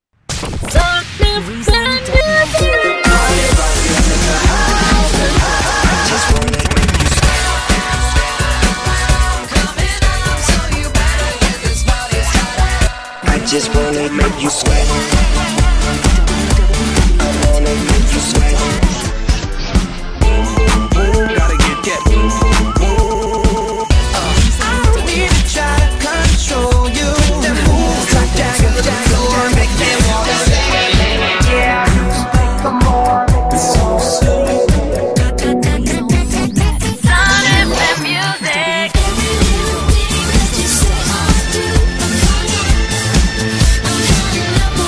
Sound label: Montage 130 Bpm; Sun Fm; Reezom